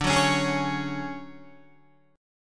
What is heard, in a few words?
D Major Sharp 11th, down Guitar; strummed; JGuitar